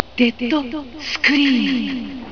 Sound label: dead scream